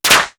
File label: odd clap; East West - Global Sounds